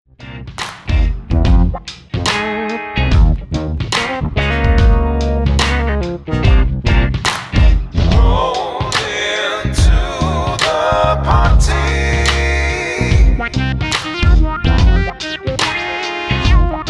Neo Soul Party Synth Solo Moog Bass Clavinet Organ Guitar Drums Vocals